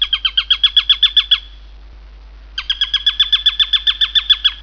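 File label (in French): epervier Européen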